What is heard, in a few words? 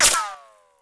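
ricochet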